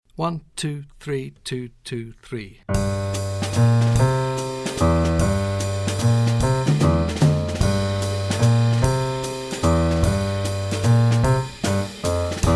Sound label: G Minor Waltz backing track, teclado jazz